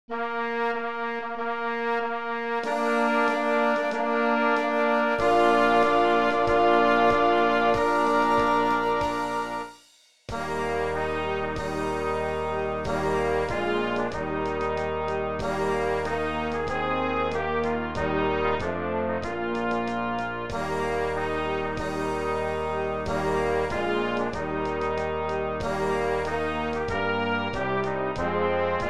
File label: Bridal March playback